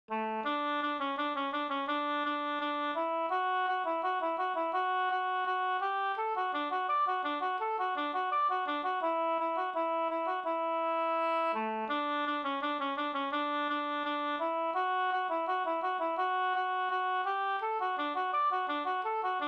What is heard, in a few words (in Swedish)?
folkdans; Rumlekvadrillen, kadrilj från Tåsinge, Danmark